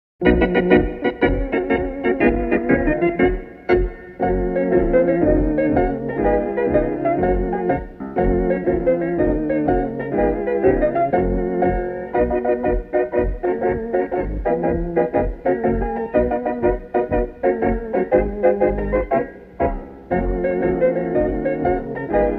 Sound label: instrumental